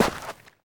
sand, player footsteps

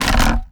board strain; breakables

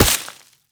grass impact bullet; physics; surfaces

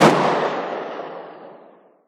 cz75a distant, weapons; Counter Strike Model Pack; CS2

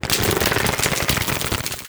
extra electrics submerge

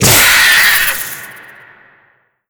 die, headcrab black; creatures; vox

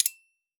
capsule countdown; ui; Counter Strike Model Pack; CS:GO; panorama

